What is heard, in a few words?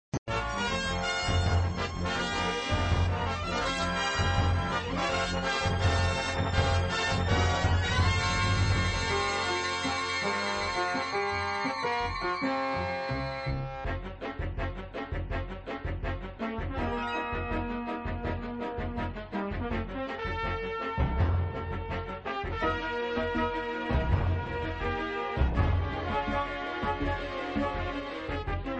Music Start of game music looping; flipper